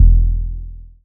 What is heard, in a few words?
Tonal Hit